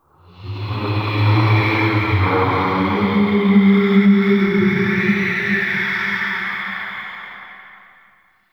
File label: Ghostly Whoosh; Ambience; Man In The Wall